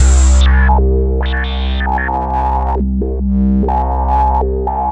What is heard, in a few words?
SYN BASS